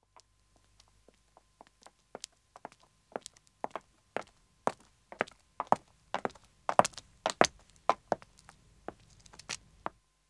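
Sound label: Pavement Walking 2 women approach, Footsteps; BBC Sound Effects Library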